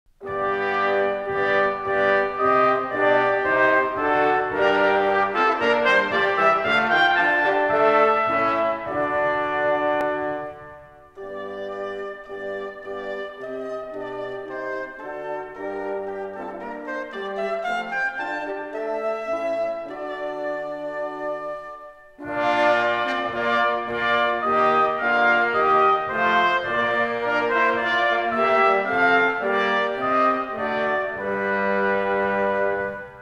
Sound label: paduana echo; posch isaak; aus musicalische tafelfreudt